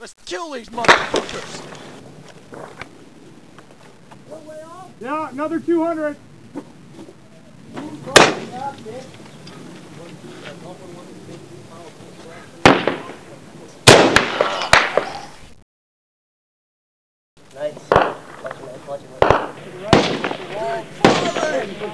Zombie battle

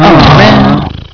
lights out

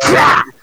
rax attack; cyrax; raxx

